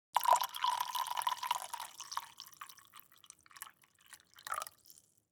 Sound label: Foley Pouring A Full Cup Of Coffee